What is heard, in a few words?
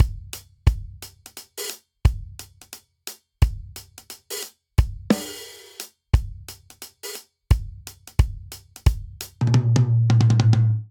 Swing Reggae Buildup 88 bpm; 4 4; MIDI Clips; Ableton; Factory Packs; Drum Booth